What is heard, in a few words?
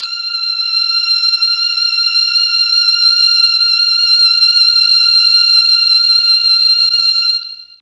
F4 3 violins, mellotron MKII